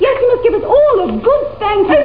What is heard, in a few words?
spank; h2siege; taunts